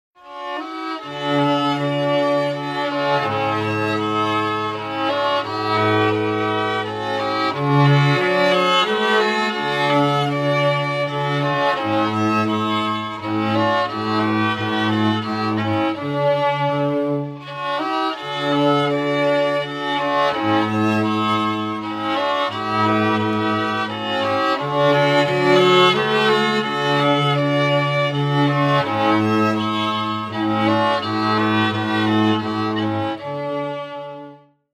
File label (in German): Landler